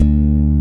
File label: D1 Strat, guitar; AKAI S-Series CD-ROM Sound Library VOL-8